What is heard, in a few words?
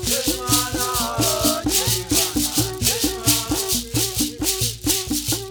RITUAL SONG, RITUALDNC; Spectrasonics; Heart of Africa 2